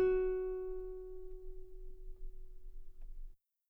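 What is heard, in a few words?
guitar F sharp 2 very long piano normal; bananenkeyboard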